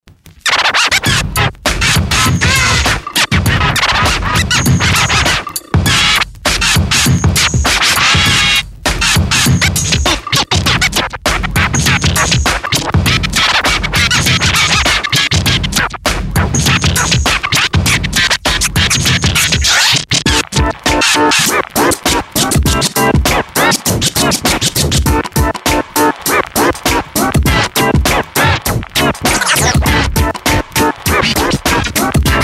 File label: Scratch BPM